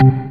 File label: DM ORG1, Dance Mega Synth